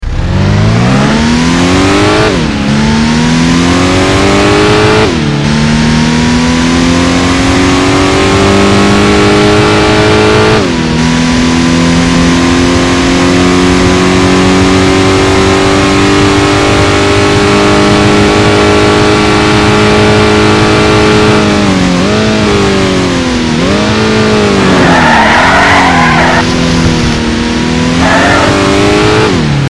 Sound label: Alfa Romeo